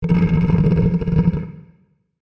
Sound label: monster growl; easyrpg; WitchsHeart